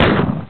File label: Doom Gun